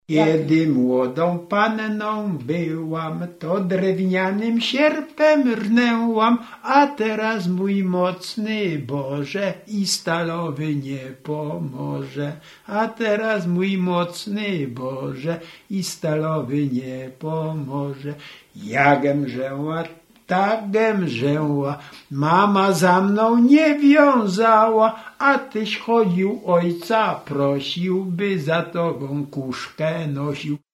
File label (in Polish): przyśpiewka żniwna